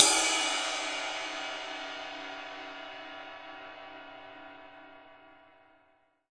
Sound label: beauty ride, long